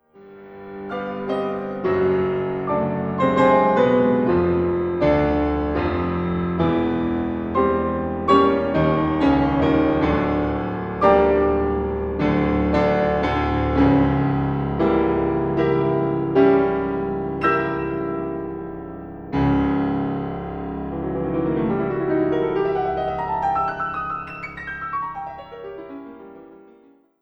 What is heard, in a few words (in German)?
Fuge für Klavier in g moll; Deduktion und Fuge für Klavier in g moll; pianostukken van Wilhelm Berger